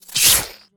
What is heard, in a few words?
BOSS Atk